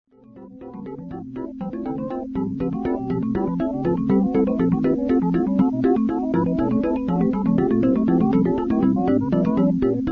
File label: main bgm